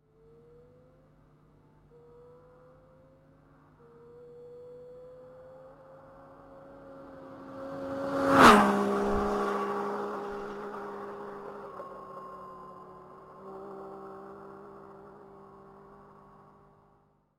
Triumph Daytona; 1964 Matchless G2 CSR

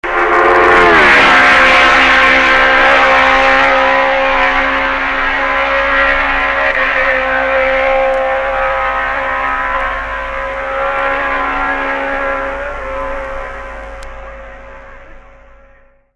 350 MV; 1965; Hailwood

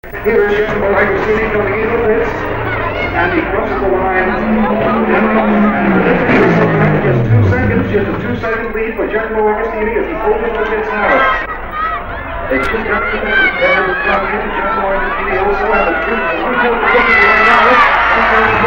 Pit Stop; 1967; Hailwood Ago

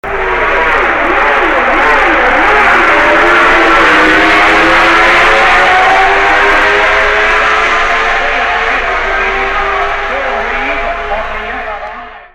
Benneli 4; 1965

